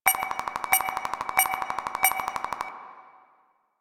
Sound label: Mining Mineral Detect Loop; Weapons; Eidolon Plains; Warframe; Eidolon Mining Lasers